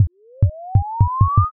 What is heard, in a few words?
pulse